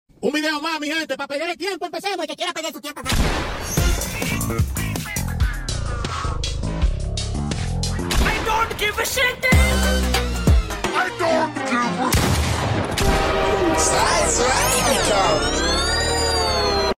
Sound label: goofy sounds